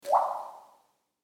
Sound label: water drop